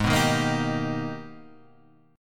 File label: G sharp Minor Major 9th, Guitar, Standard; strummed; JGuitar